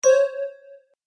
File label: Magical Message Notification; Musical